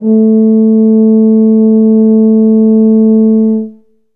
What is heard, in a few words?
tub A2, Tuba; Best Service ProSamples vol.51; Classic Orchestra 2